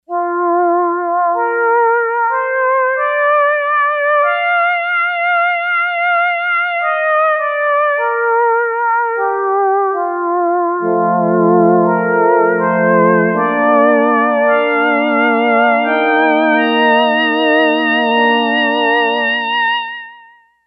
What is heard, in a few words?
Yamaha DX11 Sy. Voice 2; Factory Preset Voices